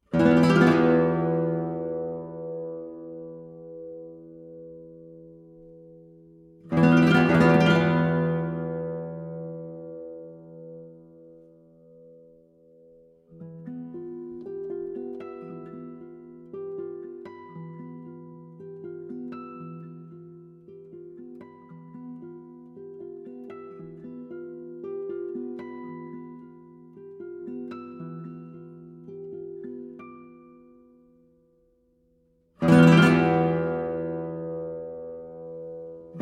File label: Guitar Solo